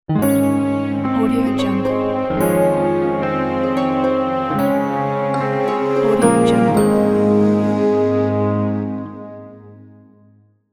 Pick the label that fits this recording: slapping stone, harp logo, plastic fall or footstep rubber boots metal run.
harp logo